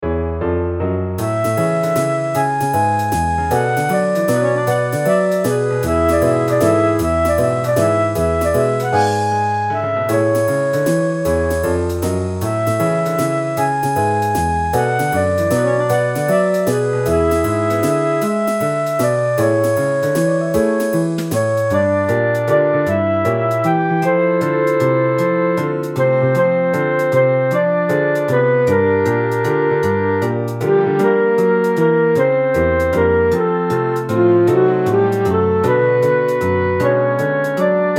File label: lively jazz waltz